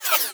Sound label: Multimedia Internet CD-Rom Flash Whoosh Short